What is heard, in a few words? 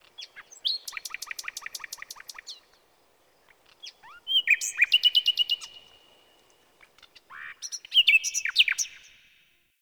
Tree Critters, Ambience Plains; Warframe; Eidolon Plains; One Offs